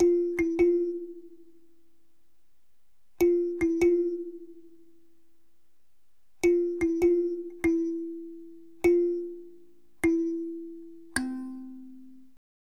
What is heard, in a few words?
keys